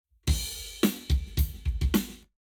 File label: Lab Wet Drums 90deg; eMagLS